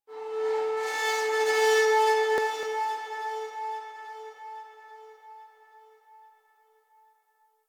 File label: Ship Metal Scrap Texture Med, Ambience; Warframe; Corpus Ship Remaster